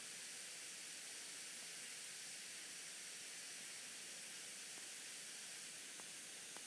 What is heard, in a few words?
Hiss; EON